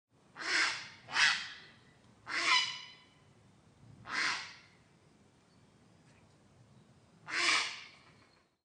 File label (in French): heron cendre